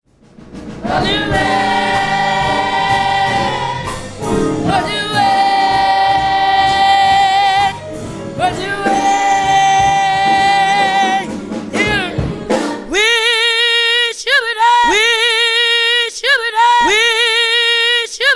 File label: soloist